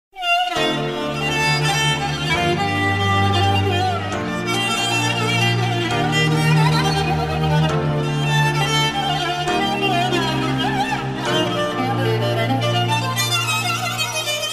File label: sad arabic violin